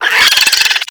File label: Infested Biped Attack; Warframe